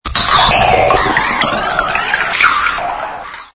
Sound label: boiyoing